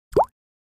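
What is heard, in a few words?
Drip Single Falls Liquid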